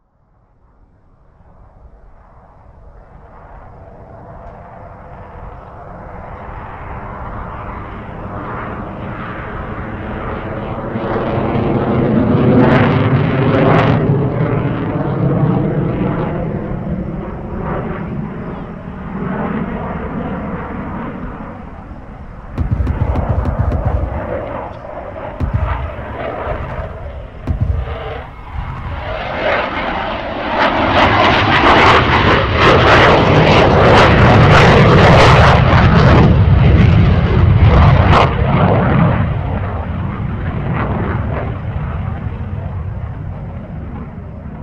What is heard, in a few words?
F18 Bombing Run Demonstration